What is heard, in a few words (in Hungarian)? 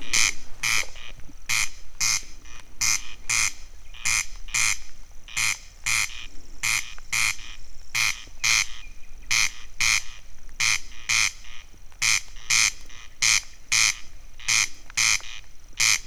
két madár csepegő eső, haris; Teresztenye